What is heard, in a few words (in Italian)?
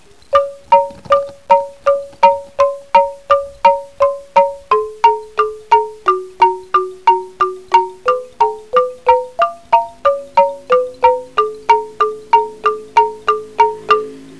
musica strumentale